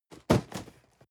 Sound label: cardboard box medium fall high; objekte